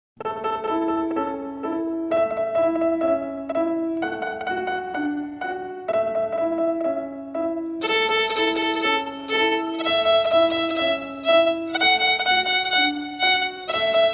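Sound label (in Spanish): Twinkle Ritmo A Lento, violin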